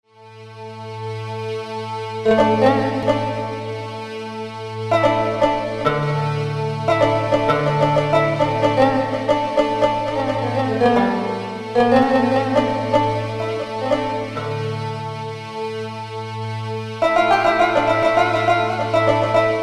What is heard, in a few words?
Kanun